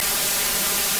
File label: white noise rep pitch 200